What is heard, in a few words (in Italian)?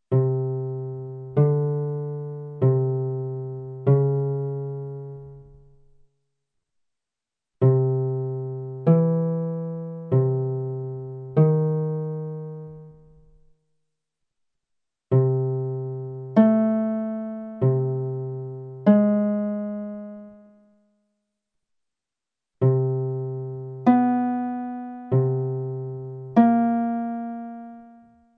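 intervalli maggiori minori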